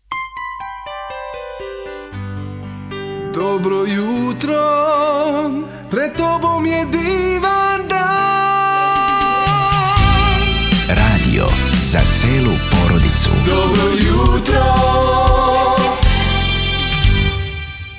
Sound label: Jingle